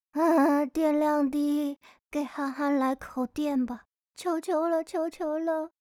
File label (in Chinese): 低电量, 手机提示音; Hanser手机提示音包